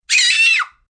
Scream, child, girl, short, piercing, fear, terror (human voice); Screaming